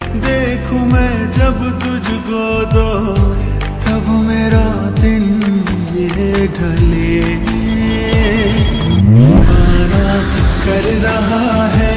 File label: lofi hindi ringtone